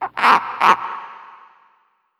Exterior Random Frogs; tldn

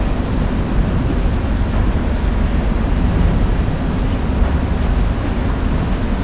Amb Loop

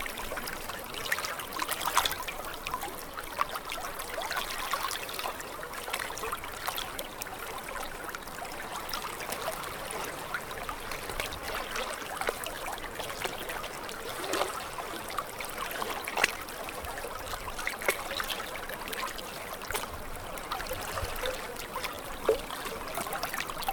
Water Lap Loop

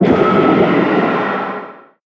Fumerol Mfld Blast

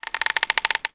Tree Squeak

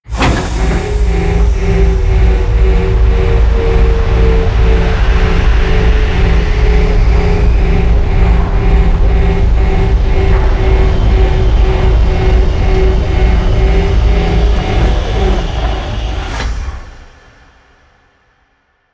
Platform Down

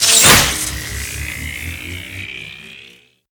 Power Coupling On

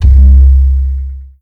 Object Creation; moul

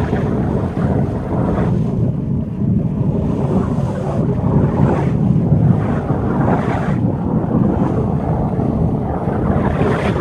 Rhumble; Kahlo

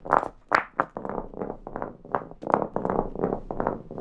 Roll Lp

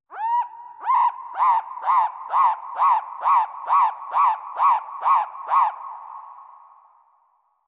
Rand Bird

